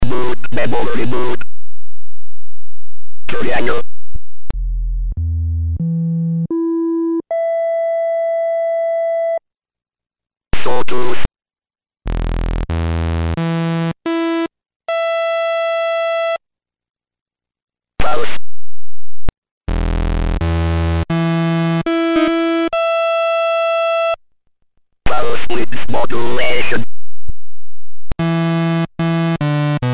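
1-osc PWM, Memorymoog Classic Analog Polyphonic Synthesizer; Paolo's tweaks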